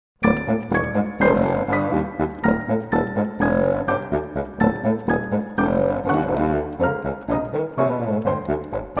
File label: Allegro, Big Bassoon